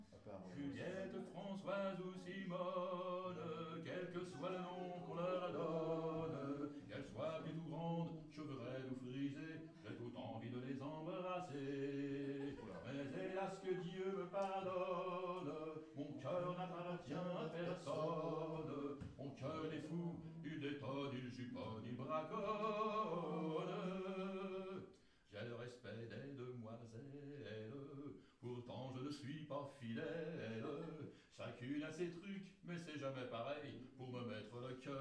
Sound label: Cabaret